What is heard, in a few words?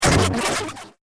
Weird Bounce Impact; Miscellaneous Impact Sound Effects